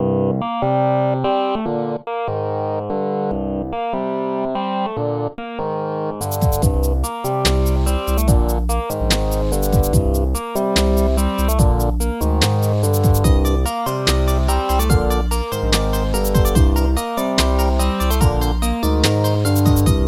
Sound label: Instrumental; lolicore; J.W.Records